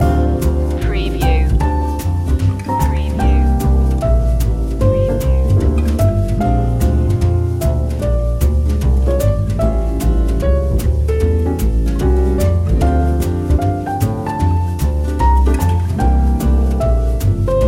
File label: what child is this jazz long loop